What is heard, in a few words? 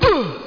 uuuh, vocal